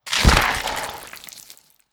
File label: Gargoyle Horns Hit